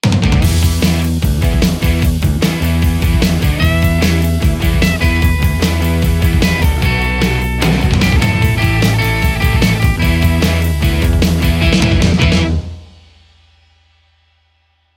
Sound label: creme dirtyclean lespaul; kuassa; ampsims